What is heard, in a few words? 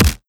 Kick Dist; Bear